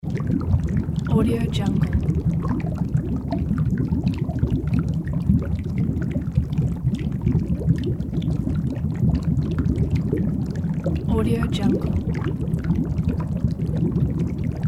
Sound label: underwater dry ice bubbles